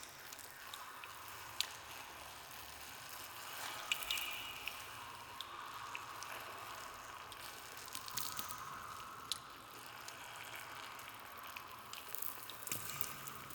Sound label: UNDISCOVERED Designed stone cave animals den water drips slimy crawls distant; wildlife